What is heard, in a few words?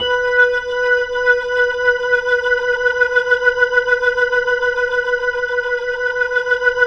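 3B R, Slow Fast Hammond, Organs; E-MU Producer Series Vol. 5 – 3-D Audio Collection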